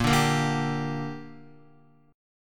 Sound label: Bb 6th Bb, Guitar; Bb6 strummed